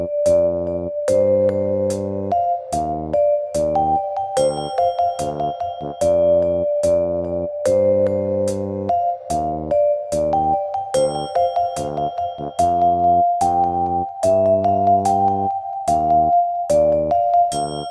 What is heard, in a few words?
slow